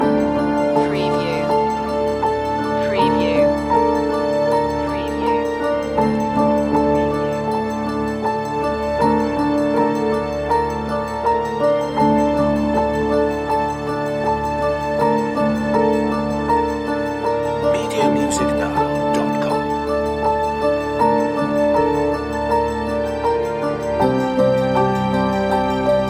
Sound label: ambient piano loop